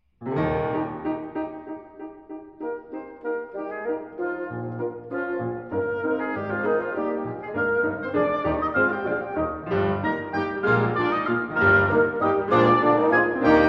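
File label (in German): Bläserquintett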